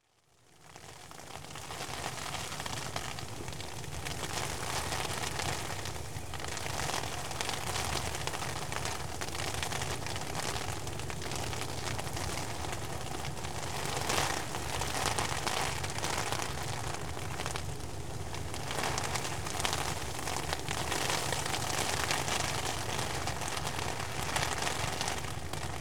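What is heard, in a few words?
Hail On Umbrella; Rivers Streams Water; BBC Sound Effects Library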